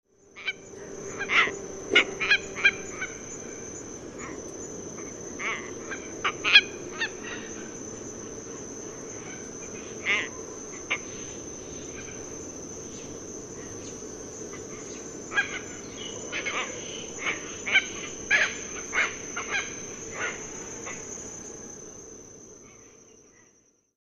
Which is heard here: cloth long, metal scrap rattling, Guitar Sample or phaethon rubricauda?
phaethon rubricauda